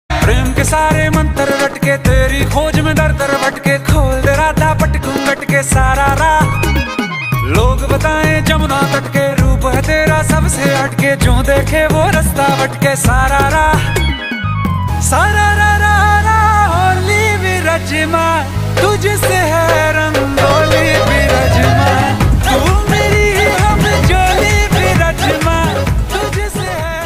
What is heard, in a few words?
hindi ringtone